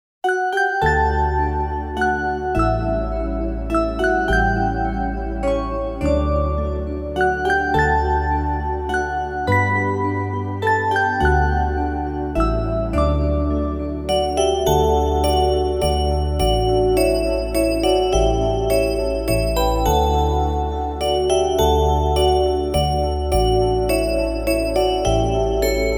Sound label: instrumental; first step orchestra; на 6 куплетов